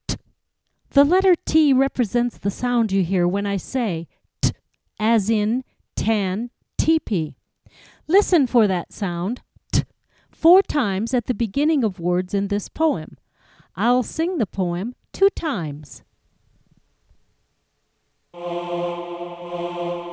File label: Bongo